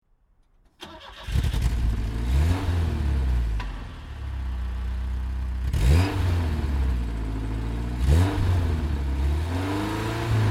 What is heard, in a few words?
Peugeot 504 TI Cabriolet 1961